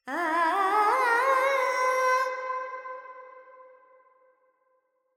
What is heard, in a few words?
Verb Charukesi, Carnatic Female Alaps